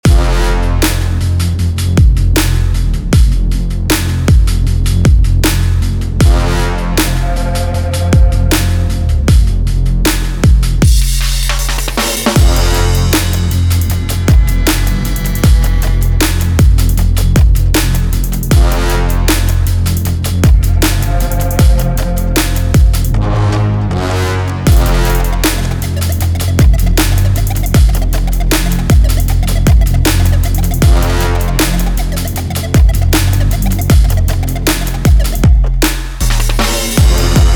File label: Dubsteppy Action